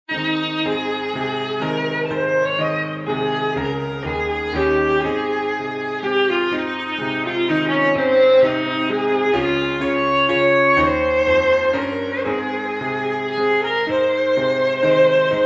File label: For Violin, hymn; All Hail the Power of Jesus Name